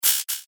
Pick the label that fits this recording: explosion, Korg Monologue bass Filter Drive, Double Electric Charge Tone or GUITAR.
Double Electric Charge Tone